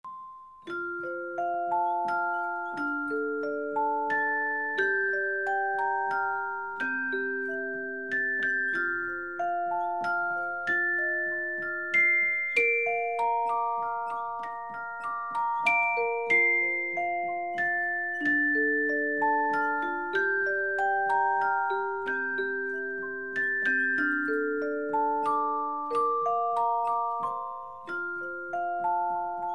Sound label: clock; orologi